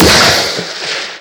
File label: Shoot; Skylanders Cloud Patrol; Arcade, iOS, Mobile; ThumpBack SFX